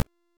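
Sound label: Siege Engine Cannon Projectile Explosion; Weapons; Enemy; Artillery; Ode Siege Engine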